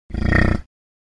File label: Buffalo Snort Single